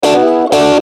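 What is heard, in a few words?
Musical Element, Drum Snare March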